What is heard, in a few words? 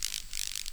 buzz, forest; ambient; Half Life 2; Episode 2